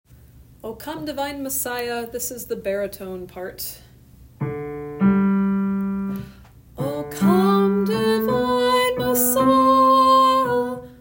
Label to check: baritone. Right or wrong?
Right